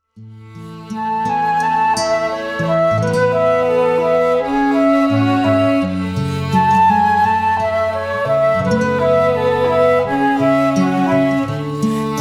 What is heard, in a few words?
Bridal March